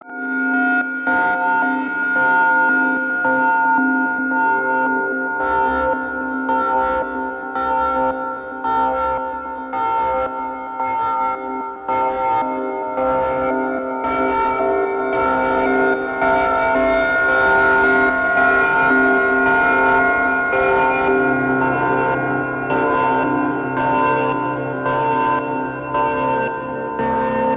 PADS Rhythmic; Danger Approaching

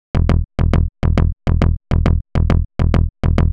Bass, Psytrance; Psytrance Collection; Houdini

